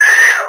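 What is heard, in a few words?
scream 8 bit fighting gal; Voice; 8 bit fghting gal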